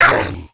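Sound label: fire damage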